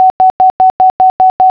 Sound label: error, morse; The Numbers Racket CD-ROM